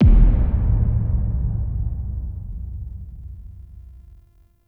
Sub Hit; Ambience; Warframe; Shadowgrapher

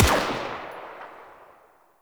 UMP Shot 3D; Warframe; Venus; Venus Flying Spaceman Agent; Corpus

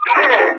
Enemy Pain; Warframe; Lasrian; Battle Barks

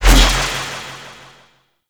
Alt Throw; Warframe; Corpus; Spear Gun; BE Ferrox

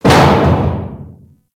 Arachnoid Camper Step Metal Debris; Warframe; Corpus

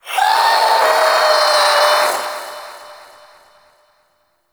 Shadow Vocal Idle; Warframe; Duviri

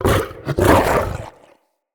Wolf Attack Growl Double; Animals

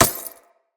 Bullet Impact Glass Large; Weapons

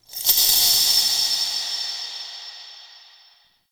Interior Orbital Station Metal Shake; Warframe; Tau

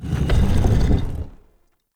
Stone Pedestal Start; UnderCity

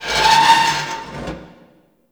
Destruction Resonant Metal; Ambience; Warframe; Corpus Railjack

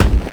Crewman Footstep Walk

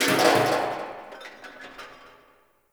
Garbage Can Rattle; Warframe; Ambience; Nineteen Ninety Nine

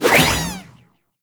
Shrink Baby Dodge; Warframe; Nokko

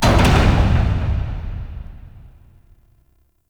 Vaults Circle Door Start; Entrati

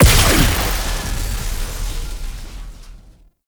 Strike Explosion; Warframe; Trapper

